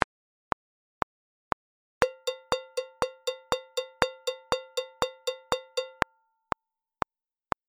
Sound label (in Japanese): 8分, delay